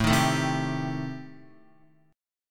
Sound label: A-Minor 7th Flat 5th, Guitar, chord; strummed; JGuitar